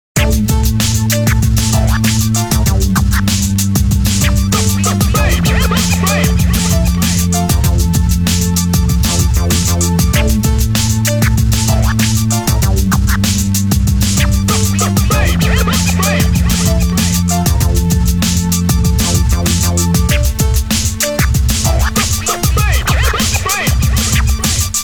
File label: Hiphop clock alert, Nokia E52